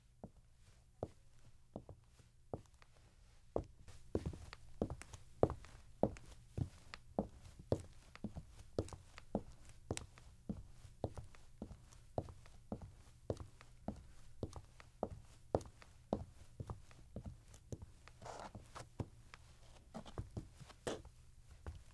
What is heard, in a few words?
Heels On Pavement